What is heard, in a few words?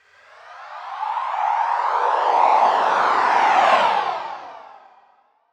Swept Noise